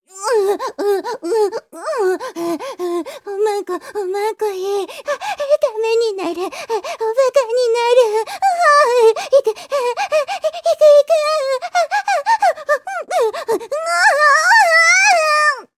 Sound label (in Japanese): んおぉっ おっ おっ ほぉっ